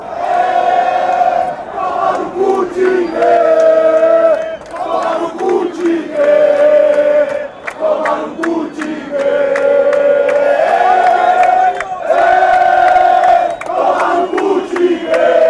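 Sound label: sao paulo chant, football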